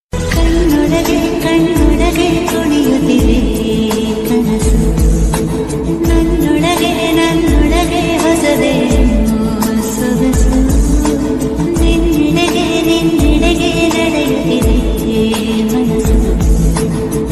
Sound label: Kannada love ringtone